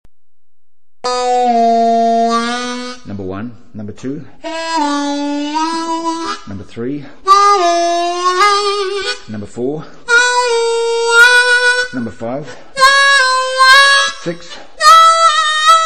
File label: bends, harmonica